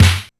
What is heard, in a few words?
Snare, LinnDrum